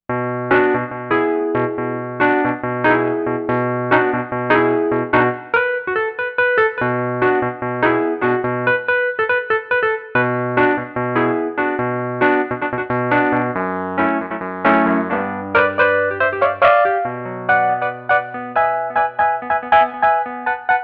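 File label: Piano Distorted Wurli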